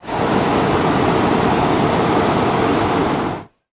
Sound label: Hot Air Balloon Burner, Medium Close, Medium On, Off, Long